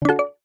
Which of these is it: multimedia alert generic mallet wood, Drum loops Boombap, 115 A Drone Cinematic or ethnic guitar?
multimedia alert generic mallet wood